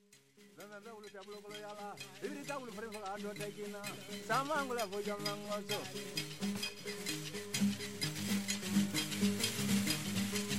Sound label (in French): peuls; afrique